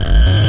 chainsaw growler, synth

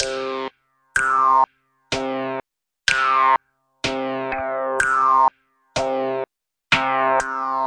125 four beat synth simple filter; SYNTH AND ELECTRONIC LOOPS

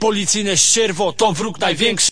male singing; VOCALS and SPOKEN WORD

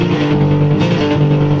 slide guitar stammer; GUITAR LOOPS

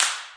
thin wispy clap; Claps

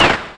Syn Dr, Overloaded Synthetic Drums; style kits

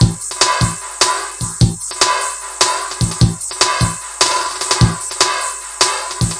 150 cicada break, drum loops and breaks; 141 to 160 bpm

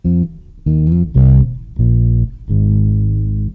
Fret bass lick; MELODIC SAMPLES

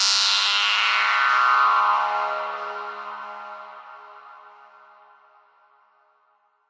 gnarly mosquito tone

